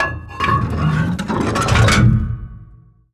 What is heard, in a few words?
gun reload; World of Tanks